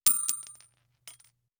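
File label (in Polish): wrzucanie pieniadza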